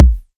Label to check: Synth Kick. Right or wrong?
Right